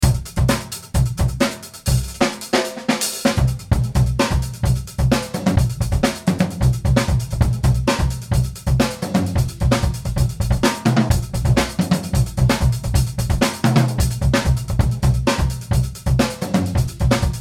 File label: blue drums xl